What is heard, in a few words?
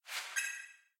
hits; Skibidi Toilet Attack